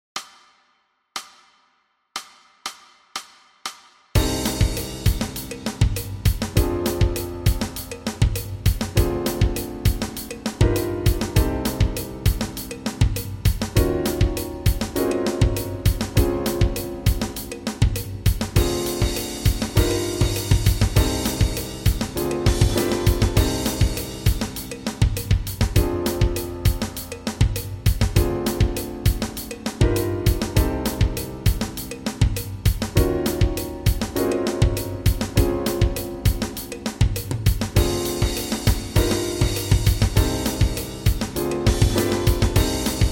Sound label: Backing Track, walking bass